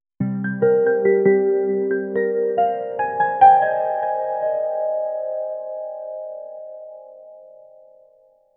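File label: gentle, arpeggio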